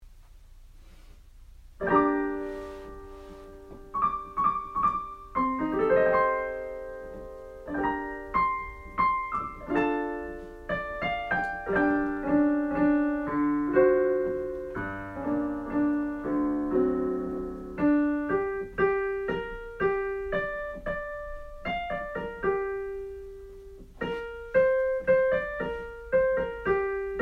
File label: Beautiful Scenery Soprano, Accompaniments